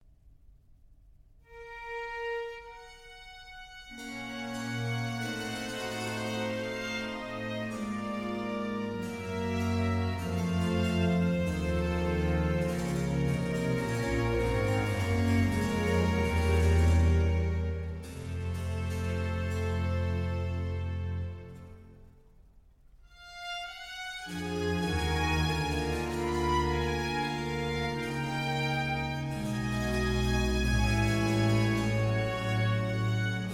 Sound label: Concerto for Violin and Strings in D, Grave; Vivaldi; Violin Concertos Nos. 1-6